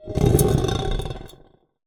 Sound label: Drag, Vase